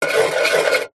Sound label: office pen biro draw shape on metal box